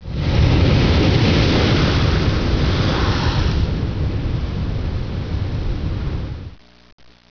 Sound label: rushing water